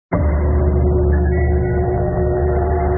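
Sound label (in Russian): динамический объемный рекламный звук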